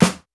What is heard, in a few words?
snare; Pop; Pophits